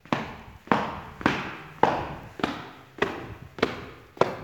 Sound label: Walking